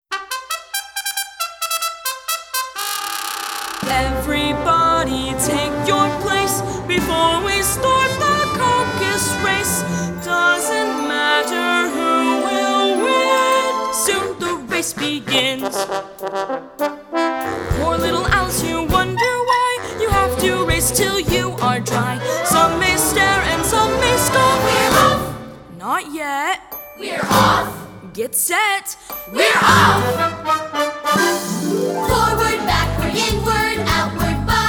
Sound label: Guided Vocal